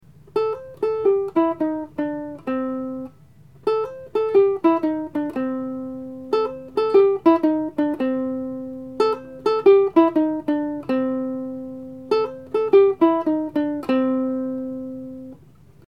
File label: Uke